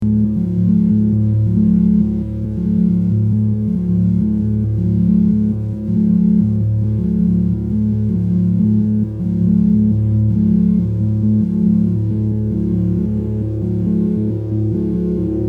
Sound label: Modulated Drone W Echo; analog synth